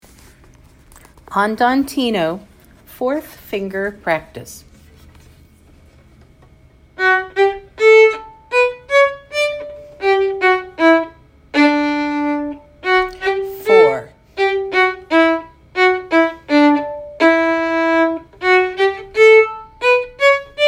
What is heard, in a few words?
Andantino 4th finger practice